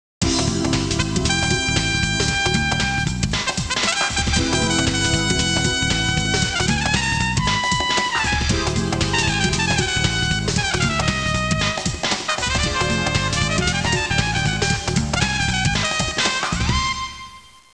suonerie musica jazz

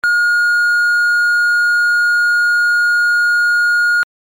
suoneria beep

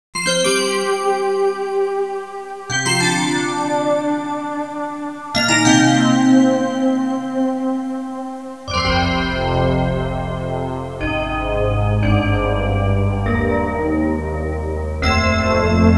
audio suonerie musica mistica

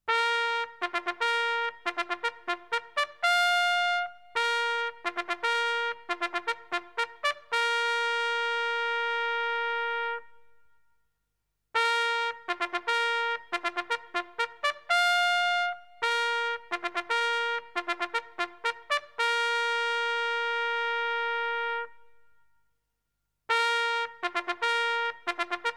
suonerie tromba militare

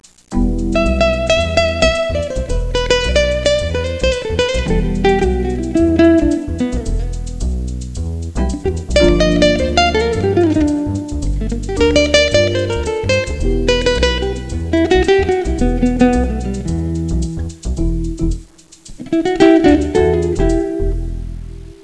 suonerie musica jazz

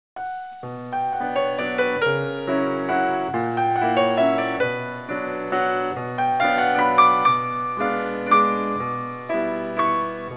suonerie classica; musica classical

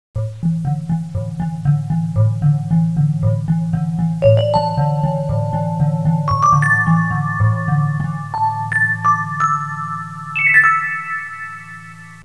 audio suonerie musica mistica

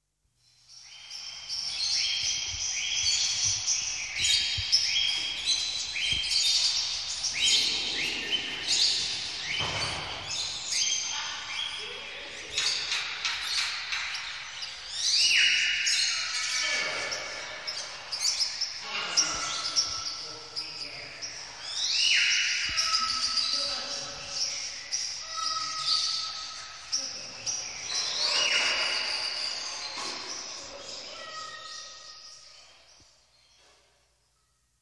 uccelli birds, animali

